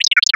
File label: Arcade Chirp; Video Game Sounds; 1001 Sound Effects